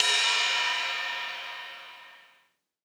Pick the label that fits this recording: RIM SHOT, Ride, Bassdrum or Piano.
Ride